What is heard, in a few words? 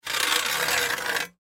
Hand Saw Drag Blade On Wood; Saws; Machines; Industry; Sound Effects:Machines; Blastwave